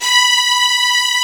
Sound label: STR2 SEC B4, Strings; STR SECT #2